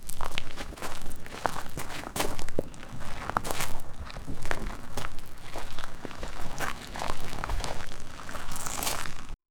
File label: footsteps on dirt, outdoors